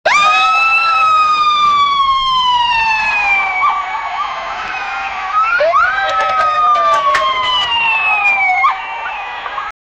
police sirens and mexican

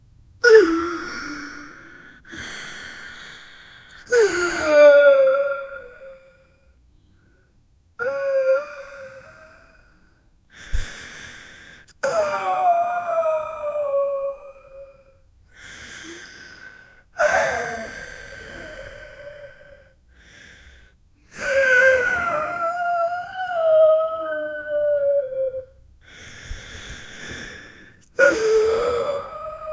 soft quiet young man cryi; human emotion

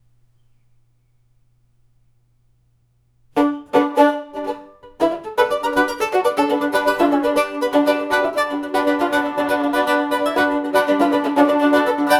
warm rustic steady rhythm, banjo

